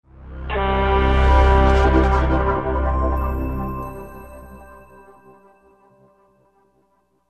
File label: Sign In; ICQ6.5